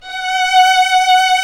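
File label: strings, 8pc; String Master Series; Vlns 2-5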